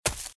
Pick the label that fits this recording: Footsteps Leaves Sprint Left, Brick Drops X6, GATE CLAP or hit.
Footsteps Leaves Sprint Left